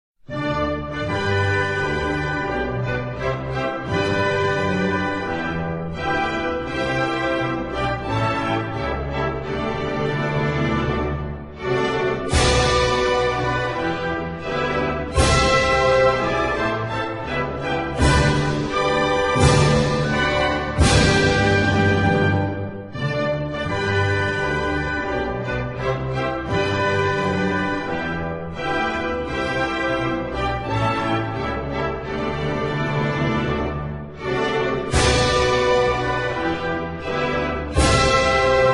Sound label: Uganda national anthem